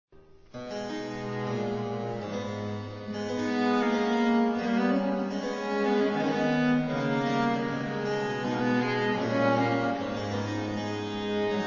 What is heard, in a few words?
Rondeau Loure; Marais